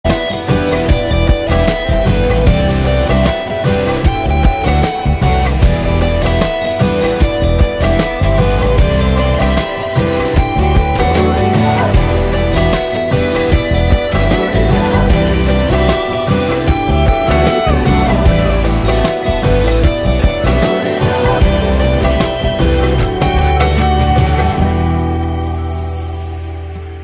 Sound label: Instrumental; Badla